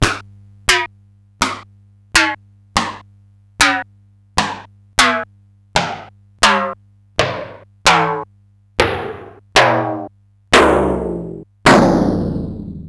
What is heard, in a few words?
Simmons SDS-9 SDS9 Rimshots